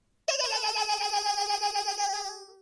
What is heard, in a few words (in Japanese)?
電話の着信音; 電話的鈴聲; 声マネキング; 声マネキング対決; フブみこさん; ホロライブ; さくらみこ